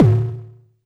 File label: Tom Low